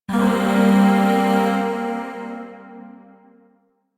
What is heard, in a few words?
Shawzin Blues Chord K; Chords; Warframe; Zariman; Void